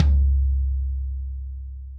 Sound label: FLOORTOM stick center; FLOORTOM SR 1614 stick center; Ableton; Factory Packs; Drum Booth